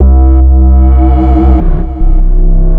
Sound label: EXTREMELY ABRASIVE SYNTHS